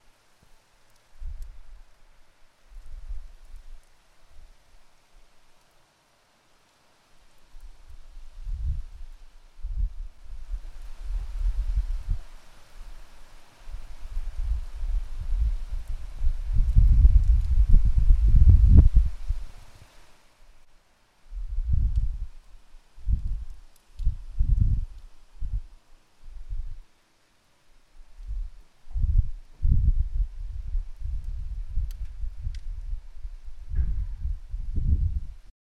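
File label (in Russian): ливень в горной деревне в Доломитовых Альпах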